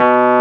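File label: WURLY NO, Wurlitzer electric piano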